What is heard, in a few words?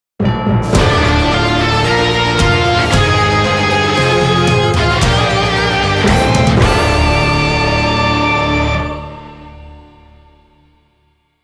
Victory; Castlevania